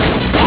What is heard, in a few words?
close door